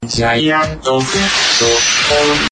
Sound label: giant foot